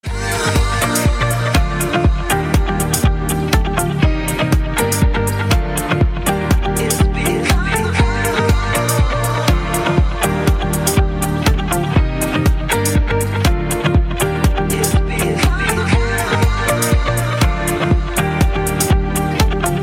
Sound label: Dance Music